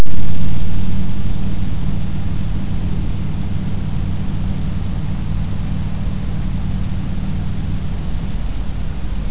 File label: 70 mph interstate, cat back dual magnaflows, dodge; audio of cat back dual magnaflows